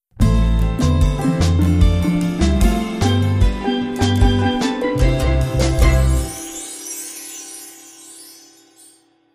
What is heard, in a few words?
Sun and Beach Melody Sting